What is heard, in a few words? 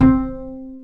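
acoustic bass soft C 4 L; Masterbits CLIMAX 7 - Bass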